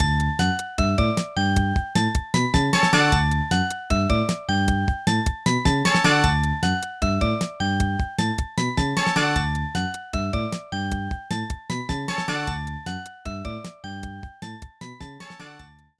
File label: Music Success Good Loop